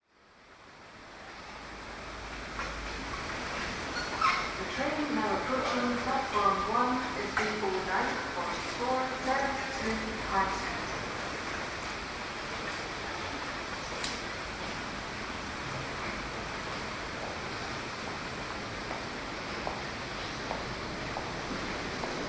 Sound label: boarding train at partick station; uksm